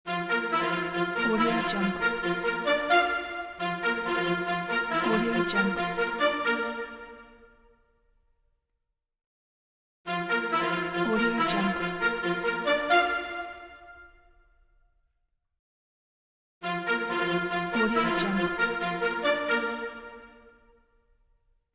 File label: fanfare for cartoon